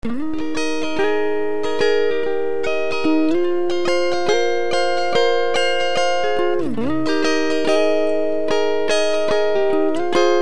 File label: Pitch Shift perfect fourth, Raised perfect fourth; Up perfect fourth